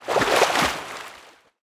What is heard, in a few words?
swim, liquid; Minecraft